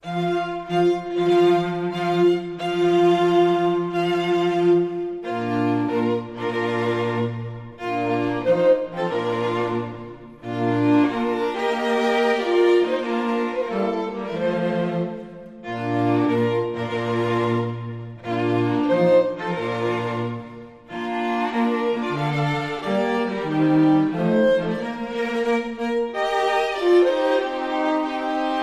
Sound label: string quartet faster version